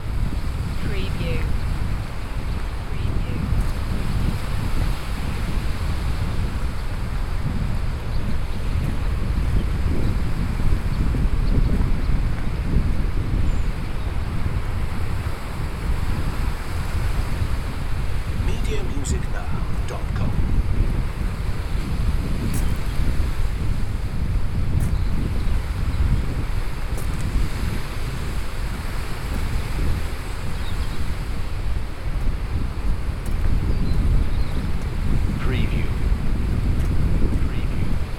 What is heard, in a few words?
Rain on a hood walking, footsteps, near the sea, windy loop; beanstalk audio